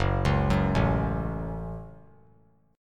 Gb Minor Gb; arpeggiated; JGuitar

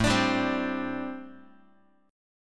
A Minor 11th, Guitar; Am11 strummed; x,5,5,5,4,x; 4A,4E,5A,5D,5G#,6C#

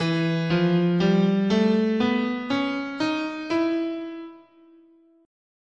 E Neapolitan Major, guitar, scale

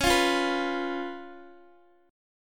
Db 7th Db down Guitar DADGAD

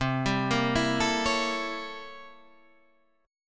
C 13th Flat 9th C

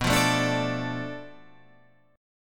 D Minor, B; Dm/B strummed

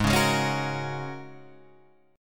G sharp 9th Suspended 4th G sharp; G#9sus4 strummed; 4,4,6,6,7,6; JGuitar

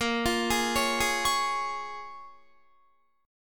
Bb Minor 7th, Guitar; Bbm7 arpeggiated

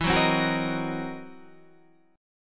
C 7th Sharp 9th Flat 5th E

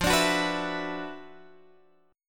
D 6th Add 9th F, Guitar, Standard; D6add9/F strummed; 13,17,16,16,17,x; JGuitar

